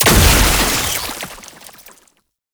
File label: Entangle Projectile Explosion; Warframe; Oraxia